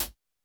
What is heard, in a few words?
Electro hihat; Reference Beat